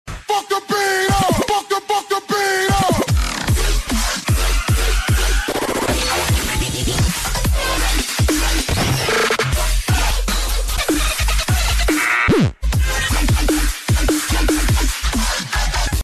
robotic dynamic

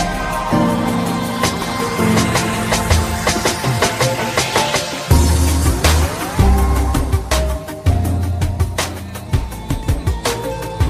nokia orthodox ringtone

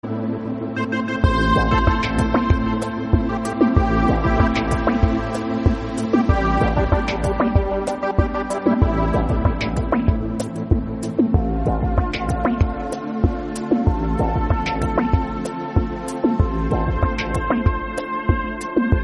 electronica musical mobile phone tone ringtone

